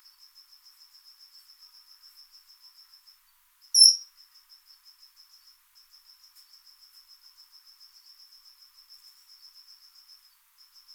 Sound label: Nycticebus female; Slow loris; Series of whistles produced by a female during her peak vocal activity or corresponds to estrus; Recording was made by Irena Schneiderova